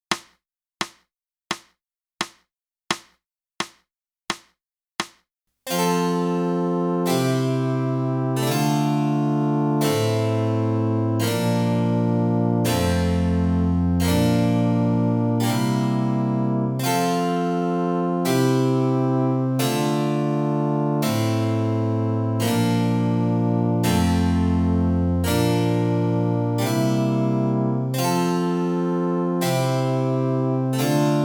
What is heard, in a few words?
clarinet